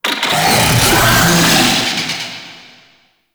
Summon Vocal Verb; Warframe; Uriel; Catenach

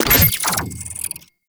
Moa Summon; Warframe; The New War; Characters; Veso

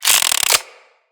Revolver Pistol Reload Chamber; Weapons

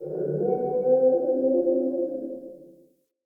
Cave Creature; Caves; Glades Of Andurin

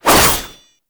Fist Claw Alt Whoosh; Warframe; Tenno; Incarnon

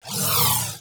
Crewship Turret Proj; Warframe; RailJack; Corpus Crew Ship

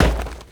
Crewman Footstep Walk

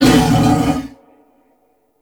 Wolf Attack; Animals; Duviri

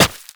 Operator Run Rock, Footsteps; Warframe

